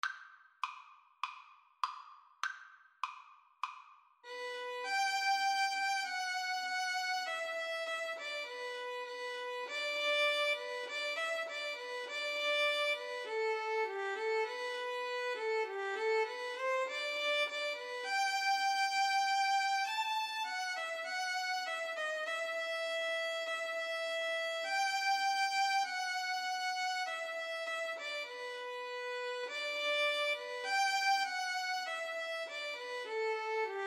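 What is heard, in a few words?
johnny has gone for a soldier violin violoncello duet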